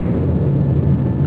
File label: Electric; U-Boat 1.5.3